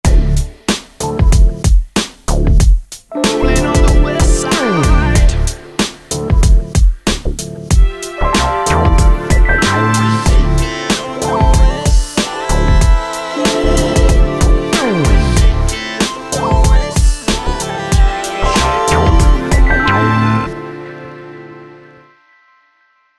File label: hiphop westcoast